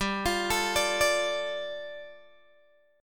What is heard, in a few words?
G 7th Suspended 2nd G down Guitar Open G